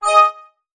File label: photon release uv; molecules and light; greenhouse effect